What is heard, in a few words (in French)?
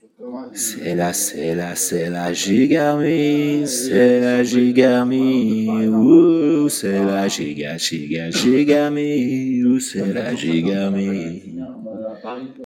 Sonorité agressive C Majeur